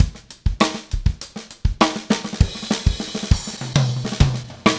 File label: Funkified, Rock Drums